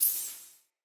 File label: Vocal Impact, Animals; Player; Player Floppet